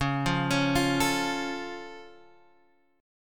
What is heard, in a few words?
C sharp Augmented, C sharp, down, Guitar, Drop D